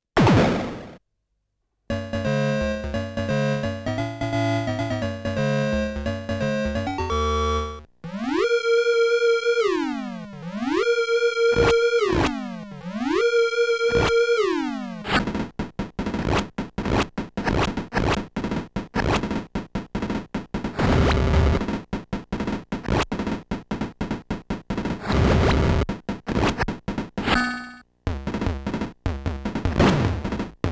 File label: Credit - Opening - Main BGM - Warning - Boss - Stage Clear - Main BGM - Death - Game Over; konami game music volume 2